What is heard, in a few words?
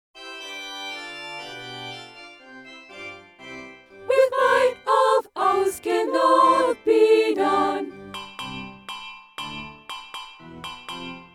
organ; A Mighty Fortress